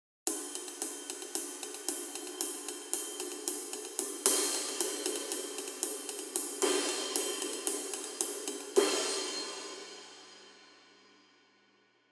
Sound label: Custom Pointer Ride 22 Flat